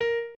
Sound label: piano